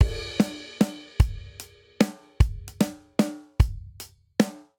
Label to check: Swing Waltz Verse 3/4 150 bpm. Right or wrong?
Right